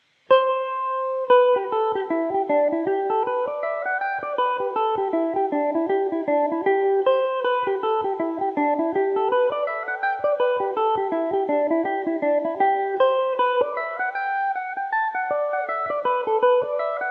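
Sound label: G major, Irish mandolin; crooked way to dublin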